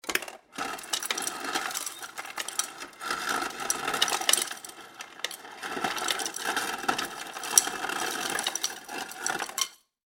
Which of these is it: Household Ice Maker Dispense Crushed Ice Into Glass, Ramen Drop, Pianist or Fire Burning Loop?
Household Ice Maker Dispense Crushed Ice Into Glass